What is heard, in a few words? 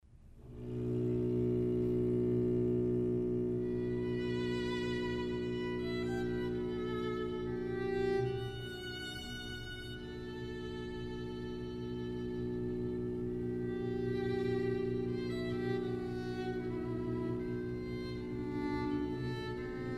String Quartets